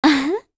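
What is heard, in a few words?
Laugh, Korean; Talisha; kings raid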